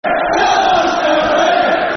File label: Football Chant (Soccer Songs); Come On Southend; Southend United Sufc